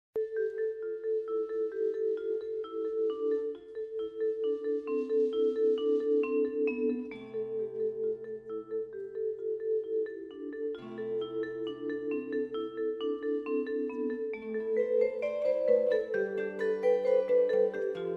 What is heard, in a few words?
Spanish Vibes